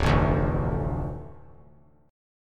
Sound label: D Minor D, chord; Dm strummed; JGuitar